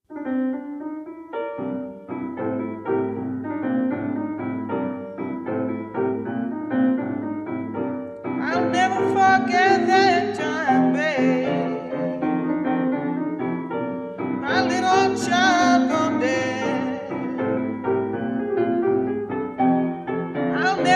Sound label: dead child blues